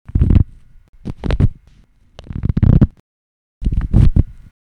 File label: fstep